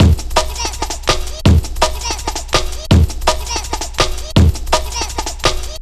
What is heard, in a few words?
165 cry baby, Drum Loops; Drum & Bass; Total Drum&Bass